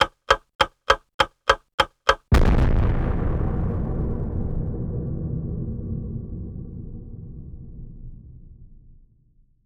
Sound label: Bomb, cartoon Foley Fx; musicradar; cartoon caper